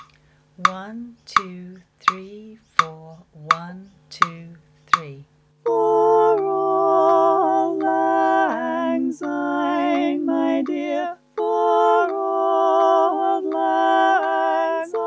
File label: Auld Lead-bari coda in C; Auld Lang Syne Resources